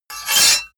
sword cut hard scrape short

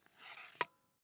air pump small in

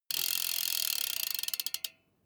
bmx bike freewheel push and slow down stop

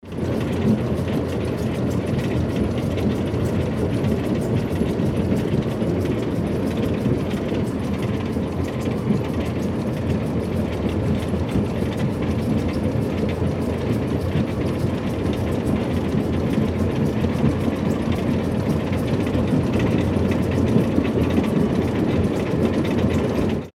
old train cabin inside

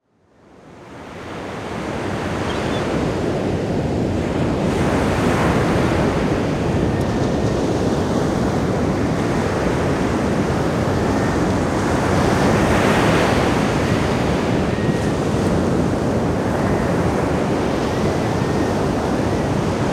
ocean beach with seagulls

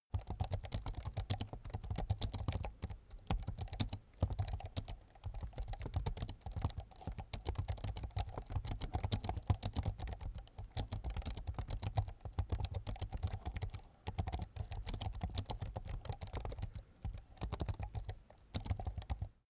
keyboard laptop type sequence fast soft

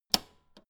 old button hard press

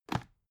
dvd case put down soft

